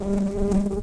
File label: Humilis workers, bumblebees